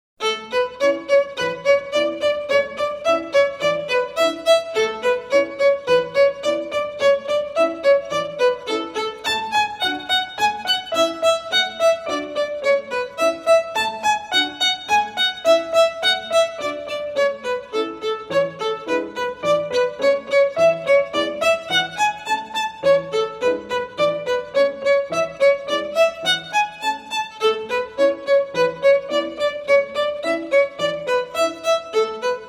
Violin; Suzuki Violin Method Vol 01; Perpetual Motion

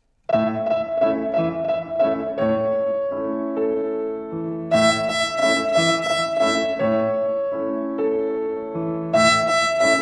Rhythm on E, Twinkle Rhythm

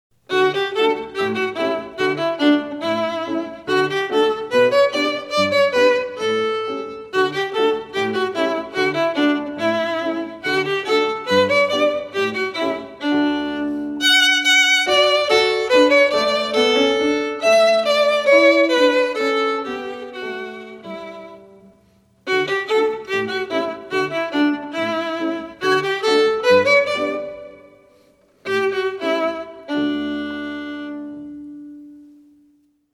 Violin Andantino; Suzuki Violin Method Vol 01

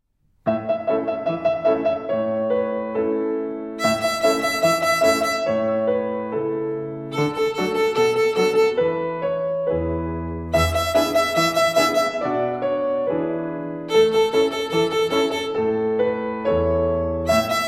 Rhythm on E and A Fifth Twinkle Rhythm

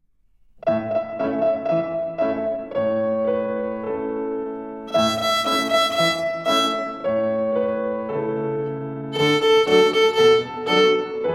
Rhythm on E and A

